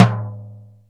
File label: ring tom, Jazz; SN-R8-05 Jazz